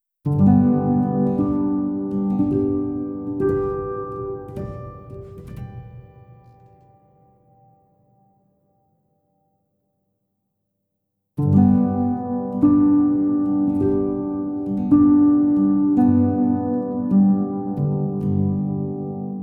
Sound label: Soft Pacific Rain (Instrumental)